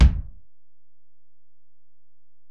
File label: Ac BD, Alesis DM-PRO; 300 Drum Machines